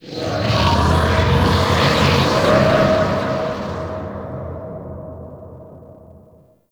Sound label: Disturbance, Ambience; Warframe; Entrati